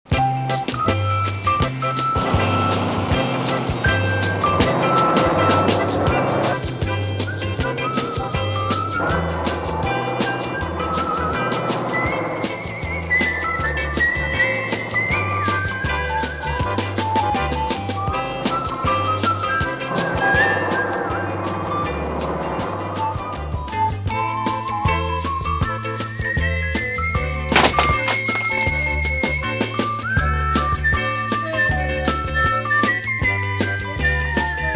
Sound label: GALLOP SAMBA COMICAL; Lupin III '71 ME Tracks